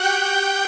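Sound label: synth strings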